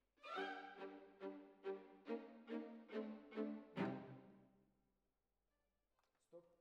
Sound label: Cellos Over